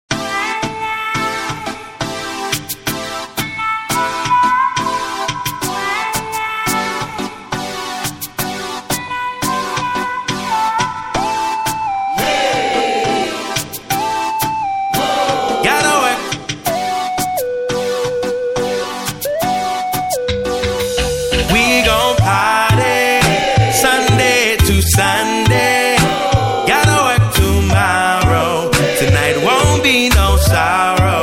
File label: reggae party dancehall